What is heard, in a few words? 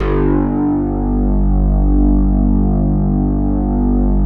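SOLID STATE, BASSES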